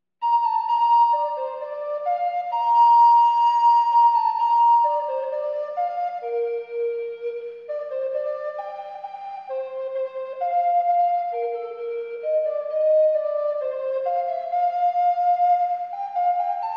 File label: prelude; pepusch